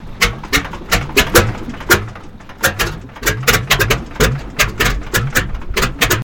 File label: Harvard Plane Engine